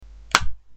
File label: click